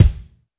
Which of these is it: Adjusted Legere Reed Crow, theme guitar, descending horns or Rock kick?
Rock kick